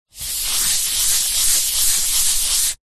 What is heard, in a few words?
office chalkboard eraser duster rub